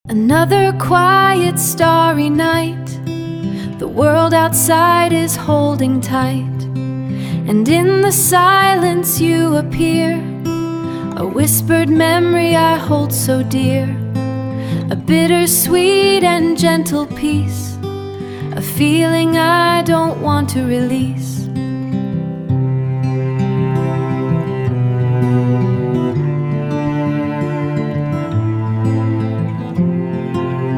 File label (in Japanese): 英語ボーカルのアコースティックソング; Lyria 3; Gemini